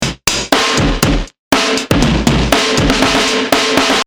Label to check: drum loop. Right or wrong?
Right